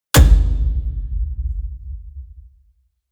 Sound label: Impacts PROCESSED; SoundBits; Just Impacts